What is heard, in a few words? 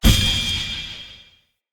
Magic Parry Perfect Counter; Casting Devices